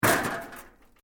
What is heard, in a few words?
METAL LIGHT CRASH; Materials